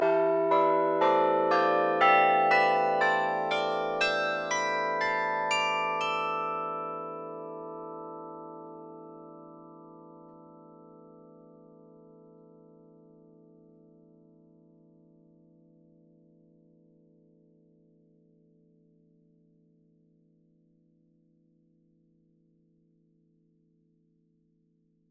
Bell Melody

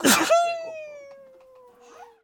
Sneezage

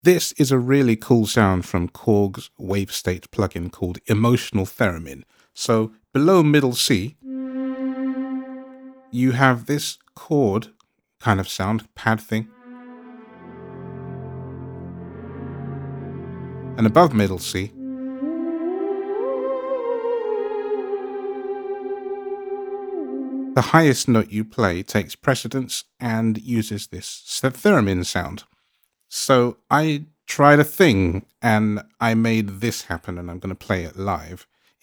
Korg Wavestate Emotional Theremin

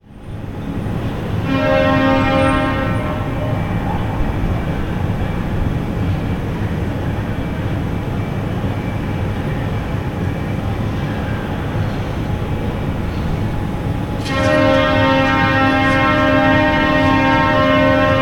Two Blasts

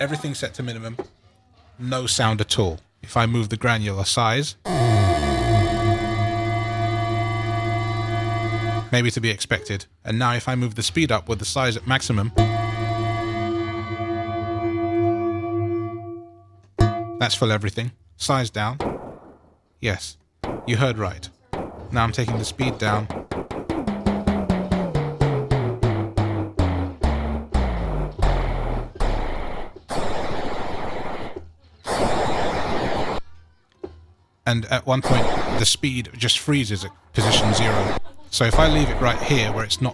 beautiful granulated ding

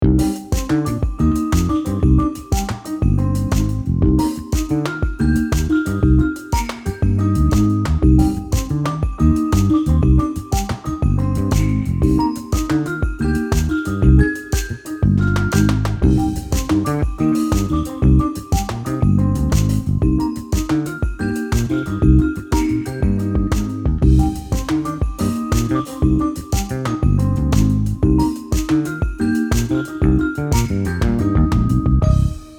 Group Bell

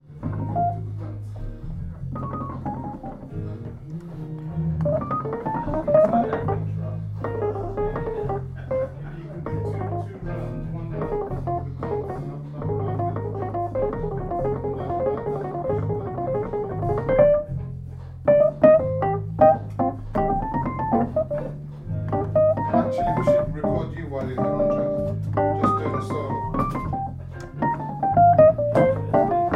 playing a Wurlitzer

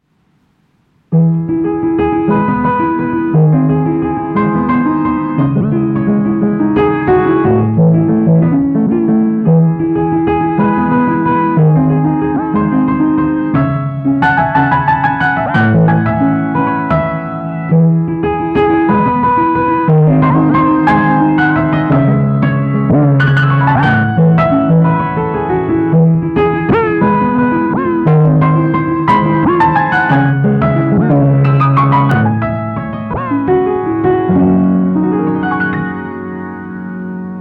Cassette; WavesFactory

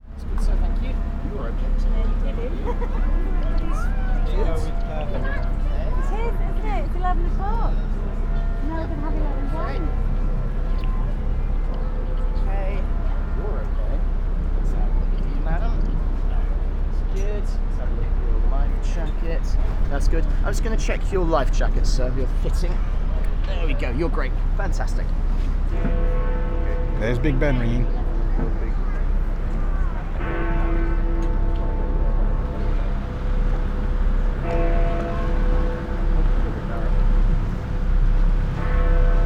Big Ben Strikes 11

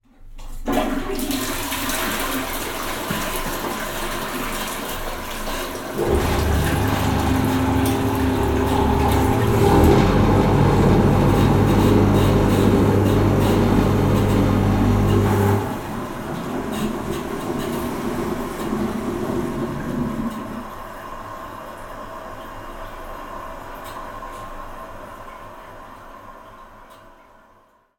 Toilet flush with Incinerator

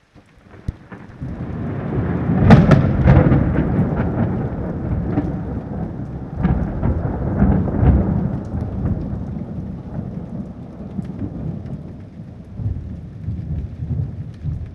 Massive Boom; weathersounds

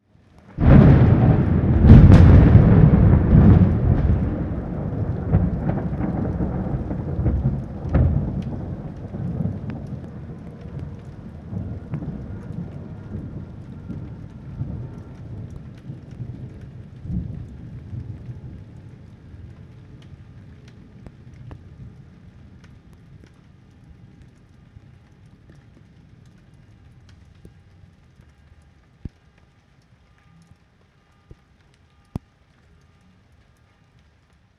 Massive Boom; weathersounds

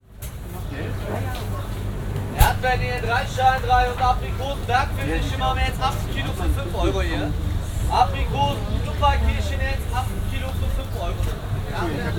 Berlin market ambience

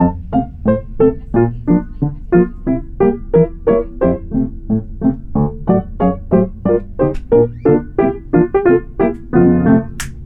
double-time piano

